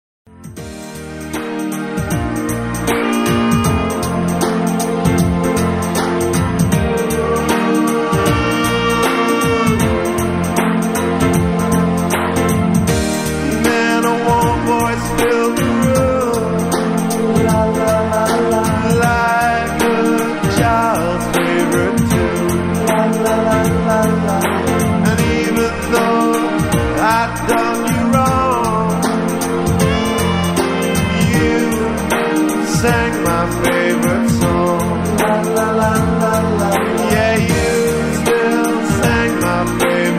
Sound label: SAX